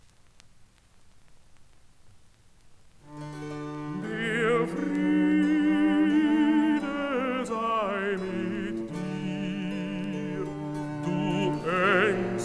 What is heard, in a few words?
Recitativo; BWV 158